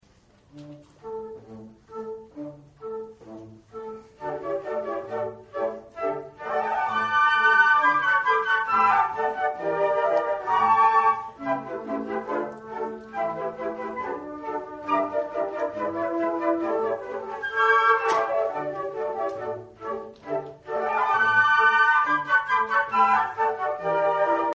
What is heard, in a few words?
concert, flute; Newyear concert 2015